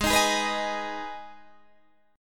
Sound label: Ab Minor Ab down Guitar Half Step Down